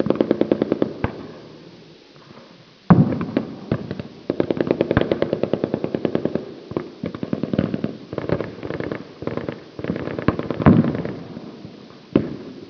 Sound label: join sound